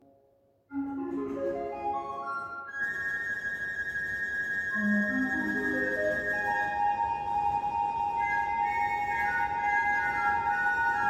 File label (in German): Hohlpfeiff 8, orgelrundgang